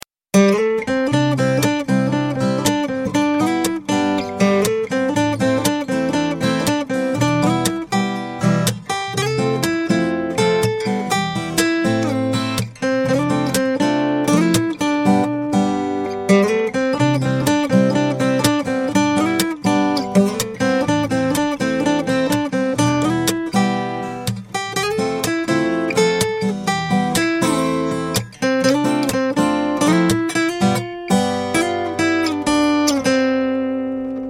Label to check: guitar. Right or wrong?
Right